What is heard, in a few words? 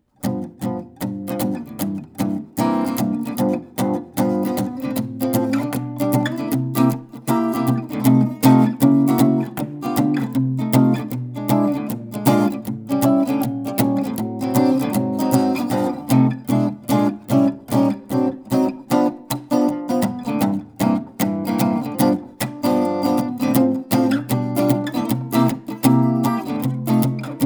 Jazz; AMI KM100f